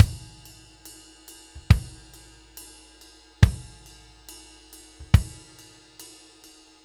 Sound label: Ballad Loop, drum; Laid Back; 70's Ballad; Sonic Foundry (Sony Creative Software); Loops & Samples Drum Tools